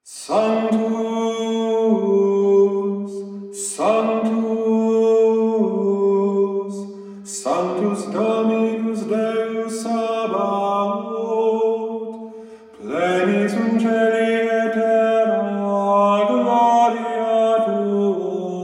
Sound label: sanctus requiem; ordinary